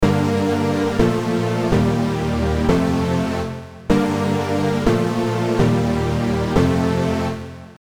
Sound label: Chord